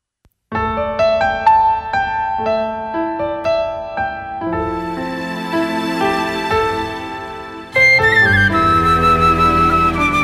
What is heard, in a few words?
beat, playback